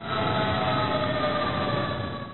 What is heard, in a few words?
siren